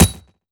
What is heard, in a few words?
frag physics bounce grass hard; gmod; viper